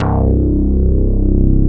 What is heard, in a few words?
BS LOW, Analog Bass, Synth Bass; Roland LCDP02 Guitar and Bass